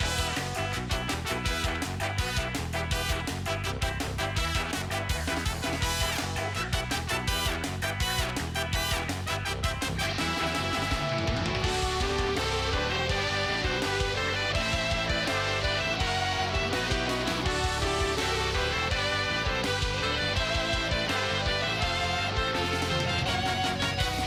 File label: Swan Lake Punk Remix; PMTOK